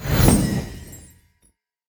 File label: heroes light Companion cast; lumenir